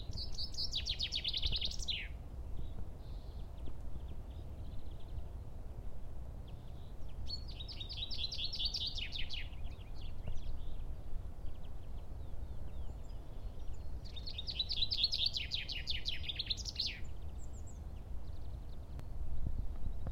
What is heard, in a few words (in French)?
Pinson